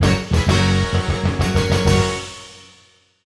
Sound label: Horse Racing Item Get; mario sports superstars 2017 3ds gamerip